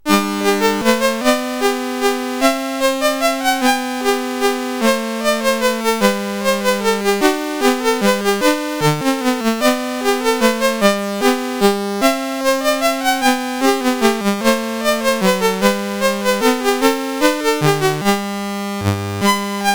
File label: Minuet, CSG8580; CSG8580R5